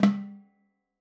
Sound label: timbales Flams; Raw Perc One Shots; Slate; SD SEISMIC Drum Sample Pack